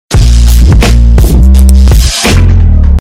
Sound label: earrape